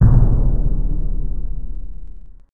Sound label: Percu; PCGamer50